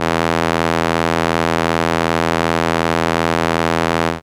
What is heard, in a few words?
Viola E2, ARP Solina Unmodulated; musicradar-synth-samples